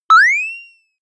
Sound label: boing